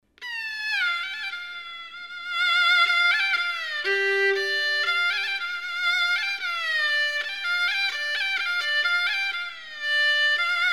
gaohu

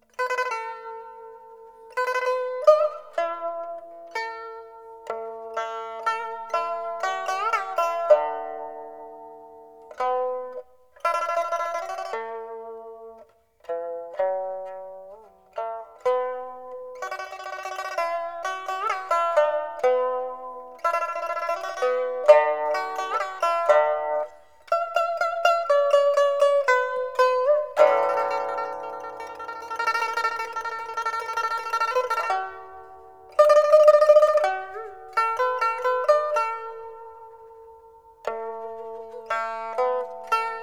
pipa

